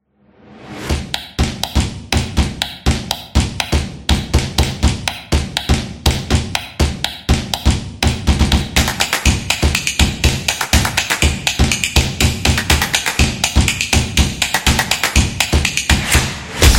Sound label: Stomp Clap Percussion; percussive nation vol 9